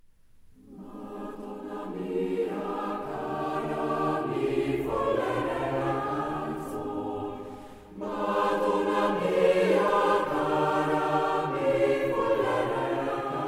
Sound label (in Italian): Villanella a 4 Voci; Matona Mia Cara; 1581